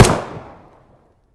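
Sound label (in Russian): меткий выстрел по мишени во время тренировки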